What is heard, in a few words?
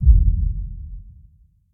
Massive bass bump; Mysterium; SyrinScape; Snarl and Oraculum